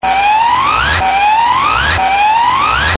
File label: FBKSIREN, GUITARS